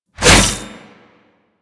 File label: Briefcase Scythe Whoosh C; Crp Briefcase Scythe